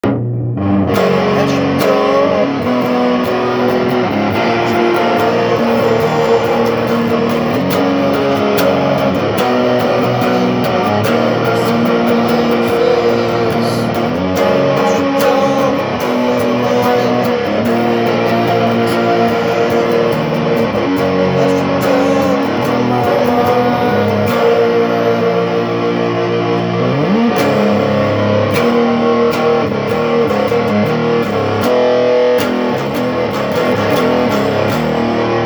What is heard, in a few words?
verse riff; estradiol, melt my mind; Musical Box Of Wonders